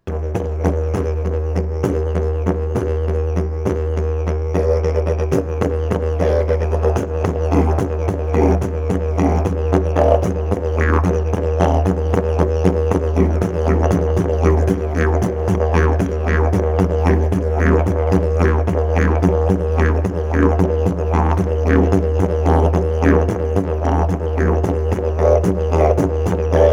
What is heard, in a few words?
didge